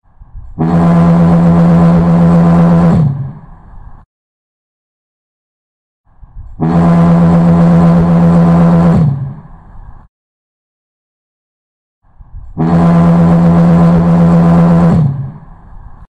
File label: souter lighthouse foghorn